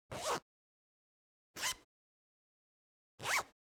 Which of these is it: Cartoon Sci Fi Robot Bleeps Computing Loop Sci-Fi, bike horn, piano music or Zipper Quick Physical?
Zipper Quick Physical